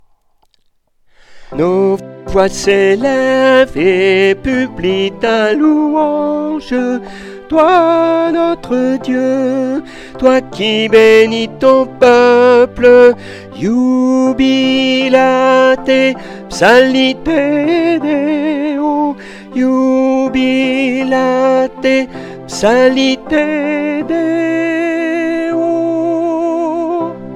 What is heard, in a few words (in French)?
TENOR